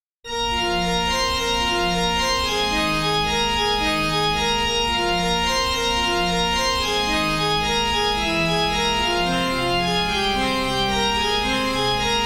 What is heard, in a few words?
organ